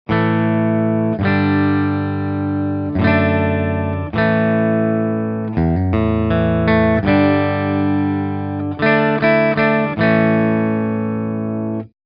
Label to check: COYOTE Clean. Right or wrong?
Right